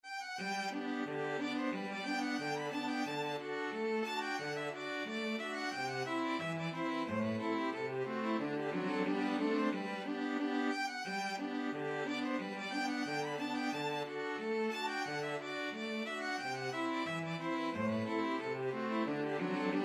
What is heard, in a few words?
sailors hornpipe, String Quartet